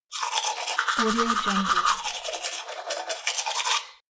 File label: brushing teeth; darksidemarket